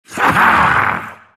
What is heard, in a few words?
silt laugh; dota; siltbreaker